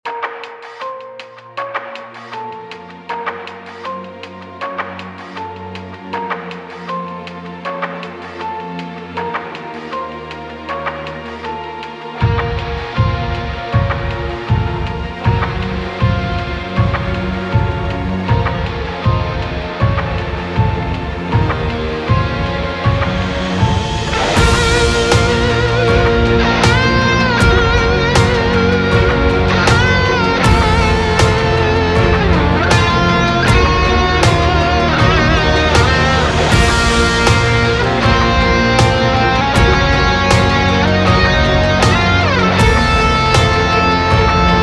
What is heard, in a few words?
Hybrid Orchestral